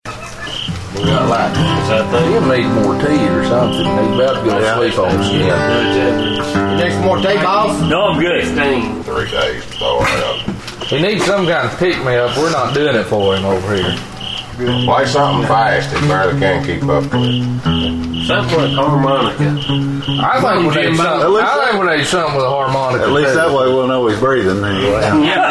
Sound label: Playa Harmonica Song